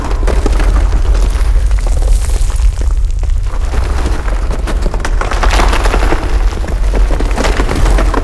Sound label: environment rocks falling loop